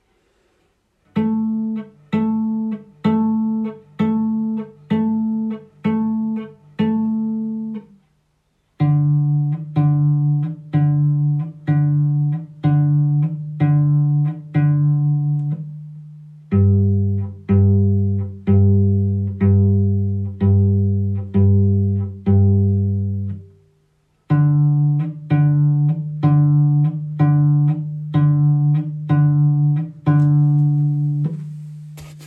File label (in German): Cello pizz; gezupft